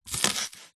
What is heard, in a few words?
spring onion single chop with knife